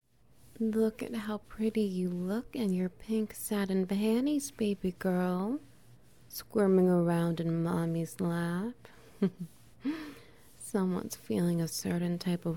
Wet Noises; Pink Satin Panties

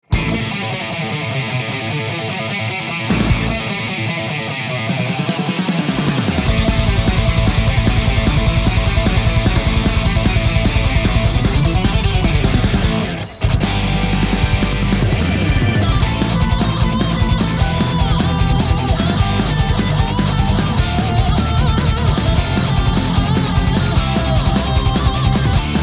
Metal

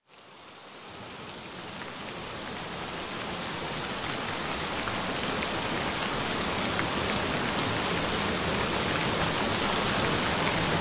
Gentle Rain Sounds; Get Stunning Grey Eyes

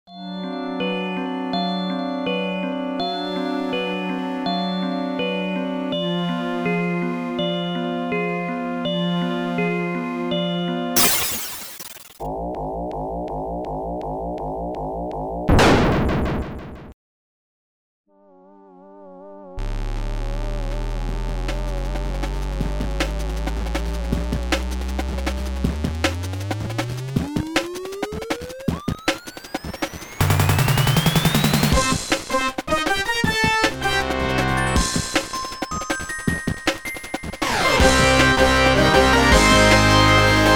Sega Genesis Instrumental Remix; The Powerpuff Girls Theme; SupremeMIDI

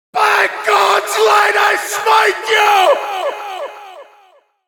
gods light sfx

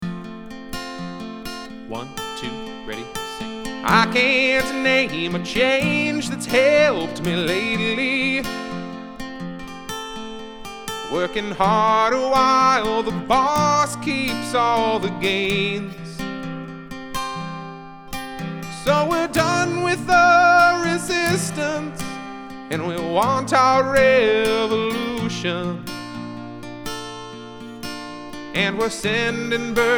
Chorus sing-along track

